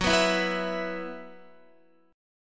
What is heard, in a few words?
Gb 7th Suspended 4th Gb down Guitar; Gb7sus4 strummed; 4E,4A,5D,5G,5C,6F